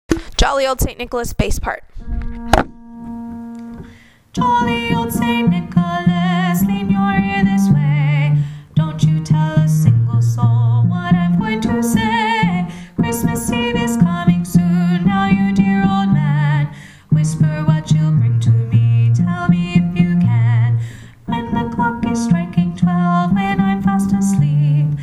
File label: jolly old st. nicholas bass